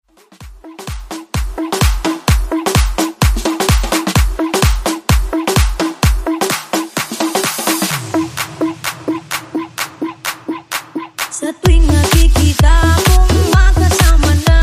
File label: Bounce Smashup